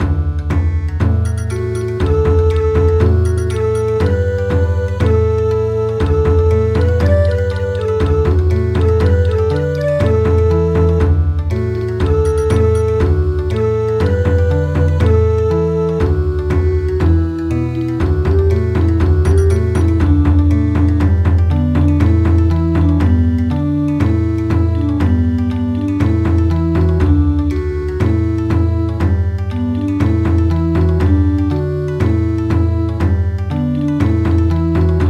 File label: Bon Odori